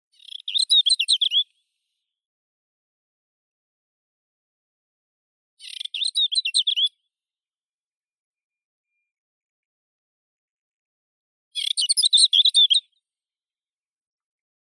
zvuki prirodi slavka pustinnaya sylvia nana

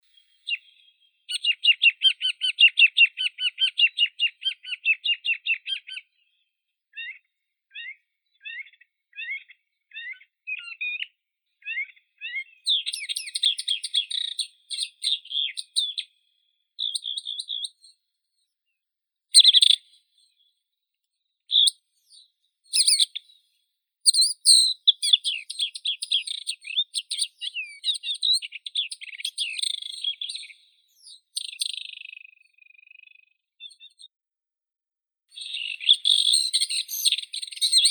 kamishovka indiiskaya acrocephalus agricola

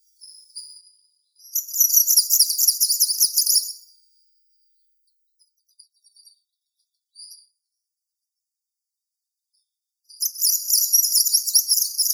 penochka zelenaya phylloscopus trochiloides

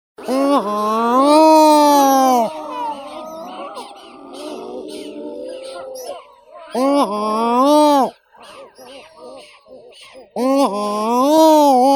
hohotun chernogolovii larus ichthyaetus

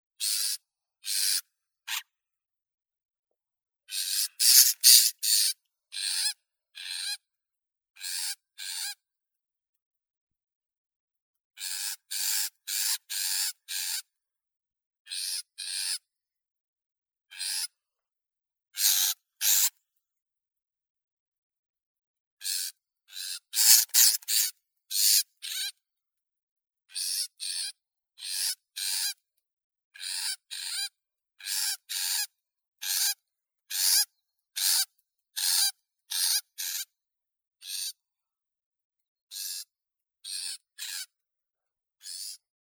sorokoput maskirovannii lanius nubicus, zvuki prirodi

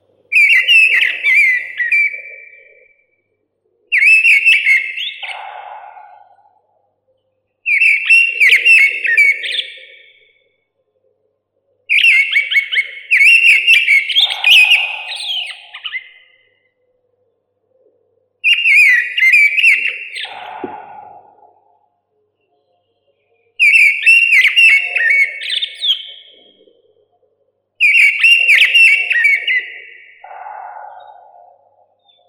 drozd belozobii turdus torquatus

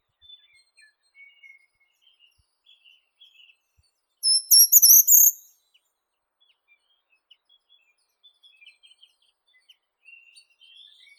pishuha korotkopalaya certhia brachydactyla; zvuki prirodi

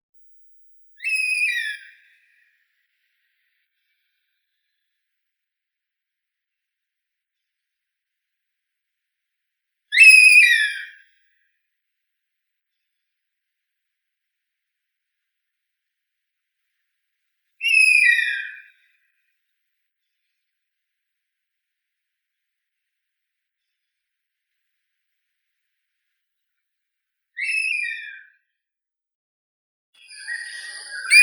osoed hohlatii pernis ptilorhyncus

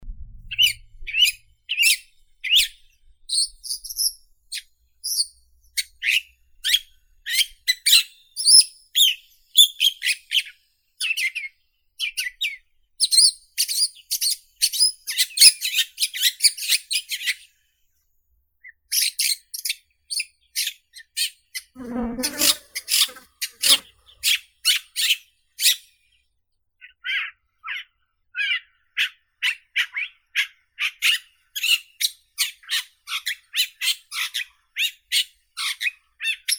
sorokoput dlinnohvostii lanius schach, zvuki prirodi

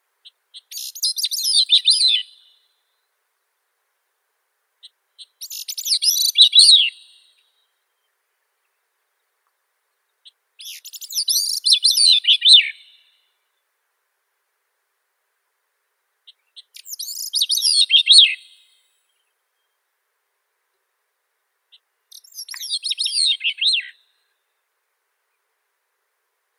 drozd malii catharus minimus

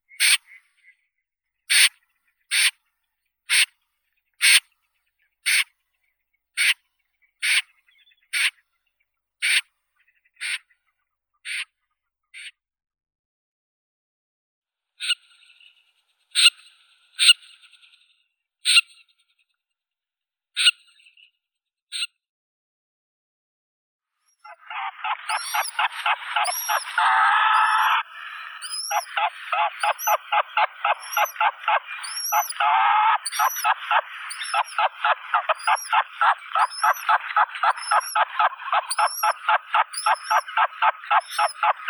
bekas gallinago gallinago

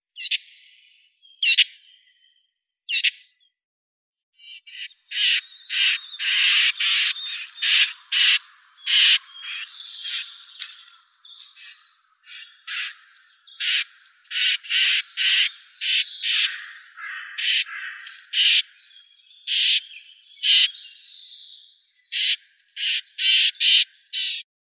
zvuki prirodi slavka chernogolovka sylvia atricapilla molodoi ptits

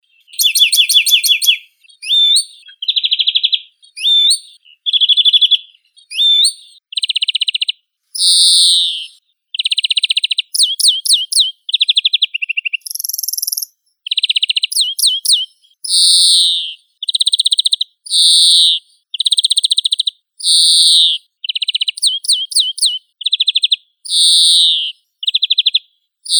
zelenushka obiknovennaya chloris chloris

